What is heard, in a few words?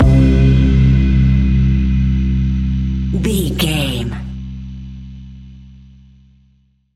chill serenity dusk stinger, A relaxed and chilling piece of music